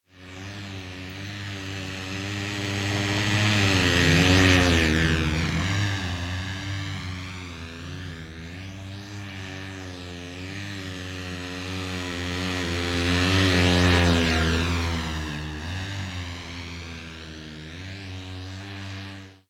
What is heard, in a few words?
Motorbike Around